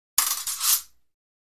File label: Fencing; Swords Hit And Clangs